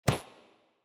ambience room secret room wisp smash

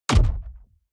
environment gold block destruction kick

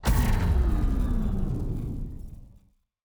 Barrier Turn Off; TANK; 3Souls

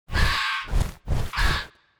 Unstable Bat Death; TANK; 3Souls

